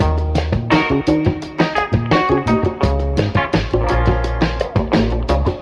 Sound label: guitar, Loops Phonky